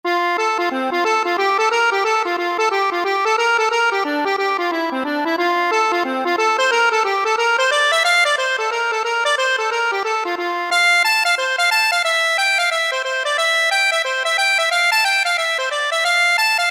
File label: black eyed sailor, accordion